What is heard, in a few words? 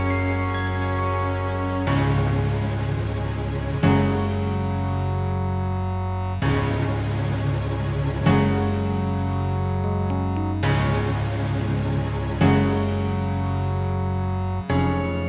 cut (Instrumental)